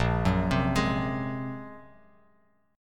A sharp 7th Suspended 2nd Sharp 5th, Bass Standard; A#7sus2#5 arpeggiated; JGuitar